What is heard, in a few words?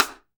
DR RIM3S; Best Service Gigapack 2